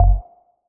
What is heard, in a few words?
Percussion, House Drum One-Shots; GhostHack; Advent Calendar; House Kit